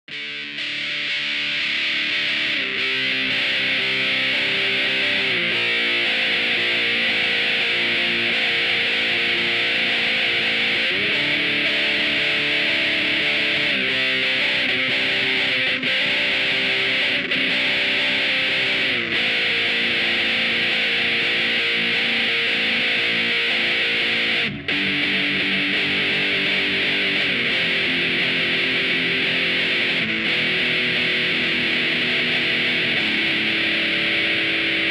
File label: super crush